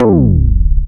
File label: BASS FX; SoundFactory